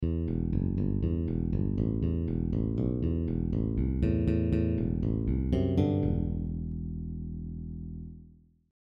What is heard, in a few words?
Bass Exercise; Tapping Licks